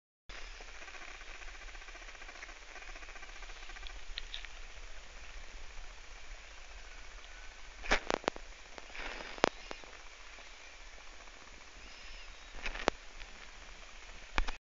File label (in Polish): Dźwięk Jak Pies Je Arbuza